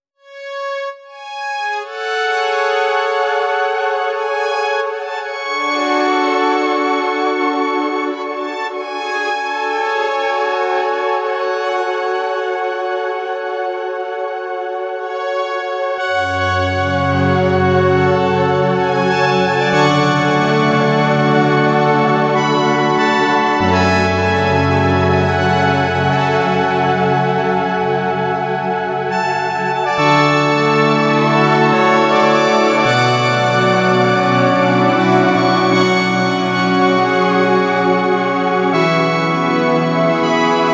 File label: OB E SEM Something Simple